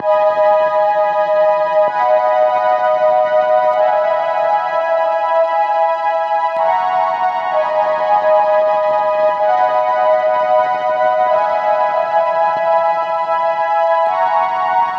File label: Vocoder Pad, 128 BPM; Vengeance Samples; VTDS2 Song Kits; Vocoder Mother Earth